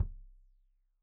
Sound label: Kik Stereo; Deeply Sampled Drums; SMDrum